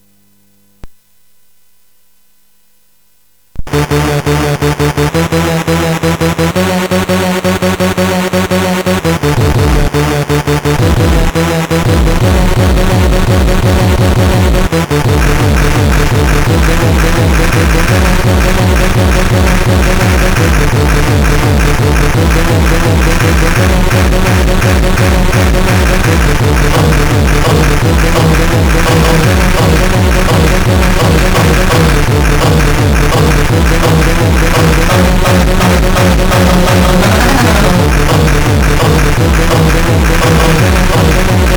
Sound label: Short Rave, CSG8580R5